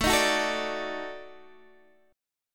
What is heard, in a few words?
Eb Minor Major 7th Flat 5th